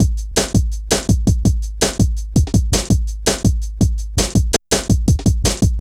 Ezee Rolla, Drum Loops; Zero-G Jungle Warfare 1, 2 & 3

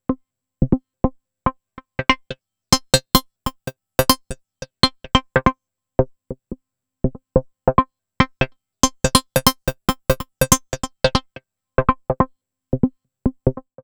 C3, Synth; 90 s Sample CDs; NiceBeats; SynthLine VOL-21

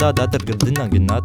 INDIA L, IND ENS PH, Heart of Asia

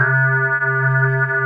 SQUARED OFF, ROLAND MKS-80; Rubber Chicken Software Sample Bank

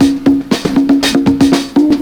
PERCS, 70 s Breakbeats

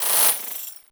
GLASS UZI; Distored Reality

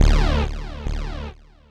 Synth Hit D; Zero-G Trance Inducer; 90 s Sample CDs

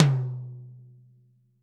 Toms; Bob Clearmountain Drums I

